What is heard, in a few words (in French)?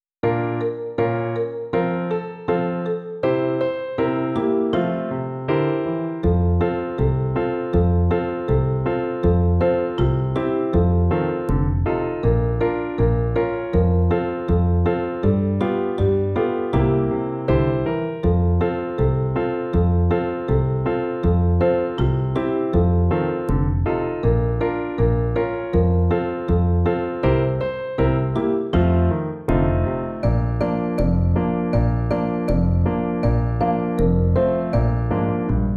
Vive le vent accomp